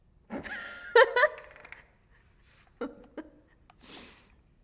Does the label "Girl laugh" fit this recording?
Yes